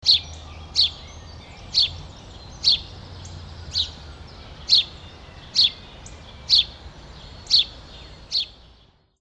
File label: park